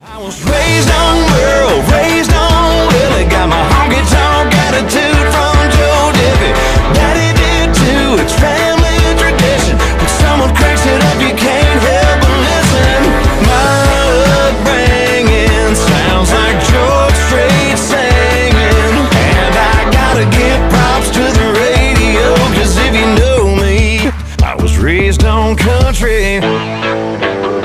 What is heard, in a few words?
ringtone